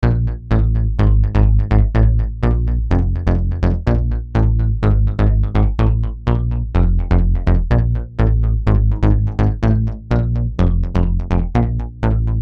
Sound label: bass chorus